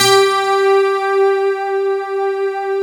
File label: JD MIDI GT G4, JD GUITAR, Synth; Big Fish Audio; Synth City